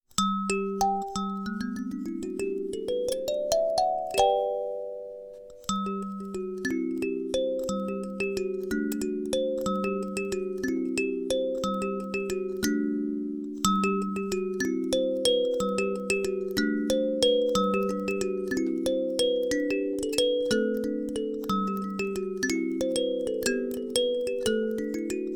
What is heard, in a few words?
Alto, kalimba